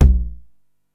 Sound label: TOM L, 909; musicradar-synth-samples; Classic drum machines; 909TOMS